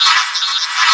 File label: MAN MACHINE; Distored Reality